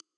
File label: checkbox check; wayvr; wlx overlay s; wgui